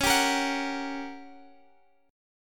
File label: G Minor Major 7th Flat 5th Db